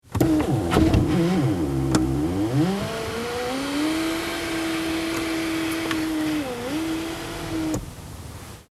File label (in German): Auto Schiebedach